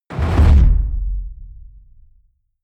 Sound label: heavy sub noise smooth, hits impacts; whooshes rises